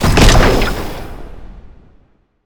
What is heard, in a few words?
asteroid Boom; Space Wave Race